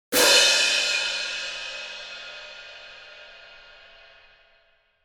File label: Sabian cymbal crash long